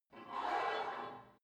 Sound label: take off weight barbell reverby quick, fitnessstudio